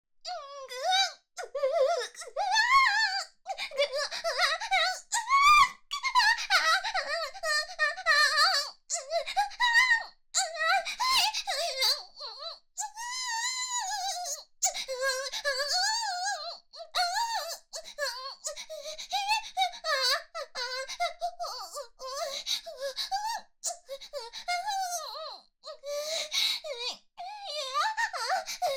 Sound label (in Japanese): SEX・激しい喘ぎ声と悦びの声; 明るい少女系カノジョ